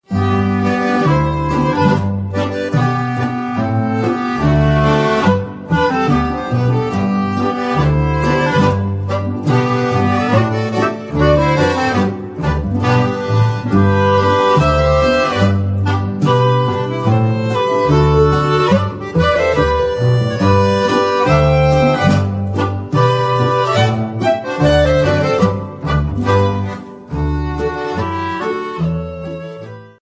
boarischer

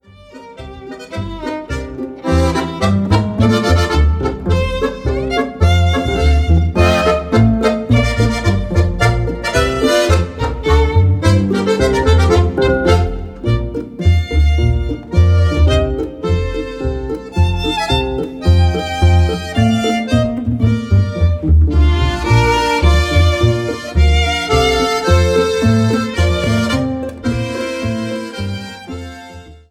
polka

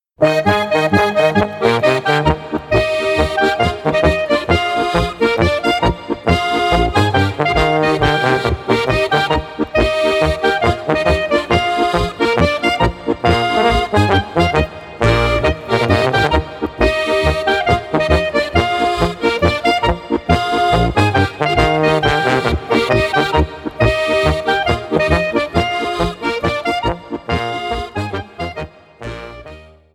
polka

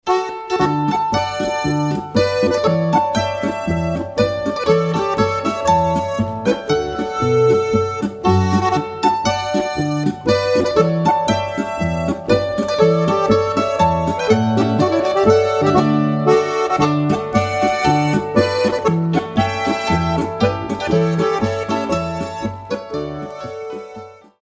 polka; riederluispolka

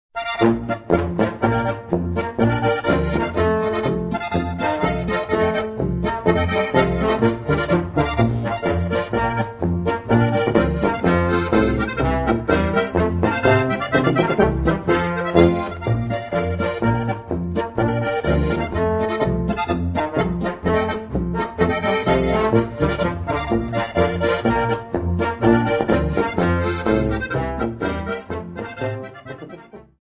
marsch

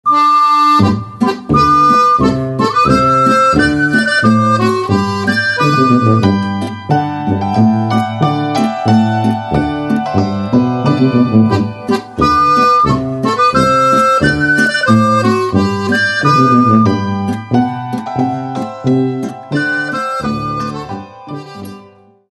pastoral boarischer

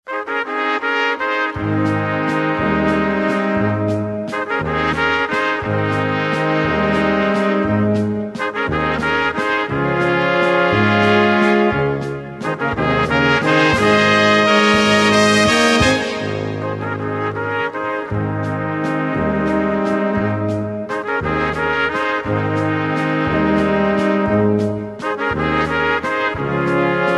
walzer

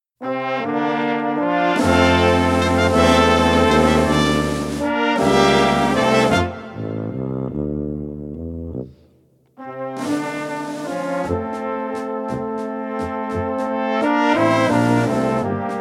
walzer

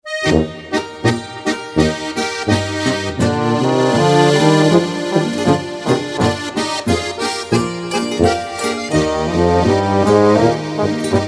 polka

